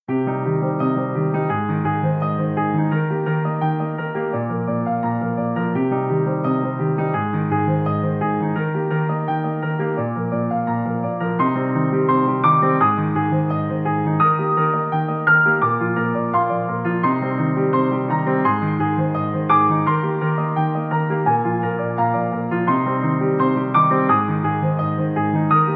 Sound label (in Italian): Attesa Piano Music; messaggi per centralini e basi musicali